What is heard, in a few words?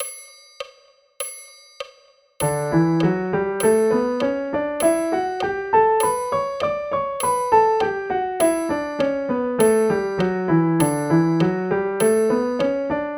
D Major Scale and arpeggio; bastien